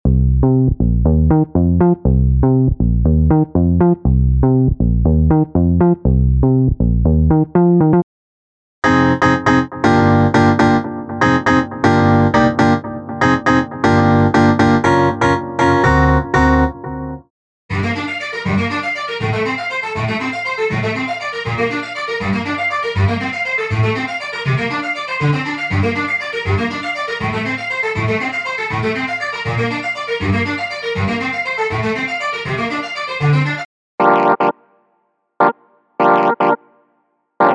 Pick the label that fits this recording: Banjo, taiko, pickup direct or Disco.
Disco